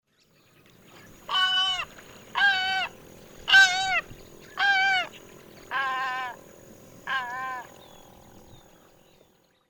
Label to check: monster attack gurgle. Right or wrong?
Wrong